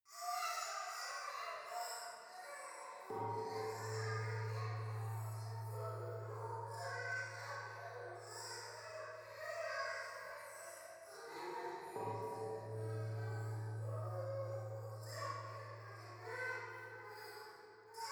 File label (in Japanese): 夢の中 シャブ喘ぎ 神社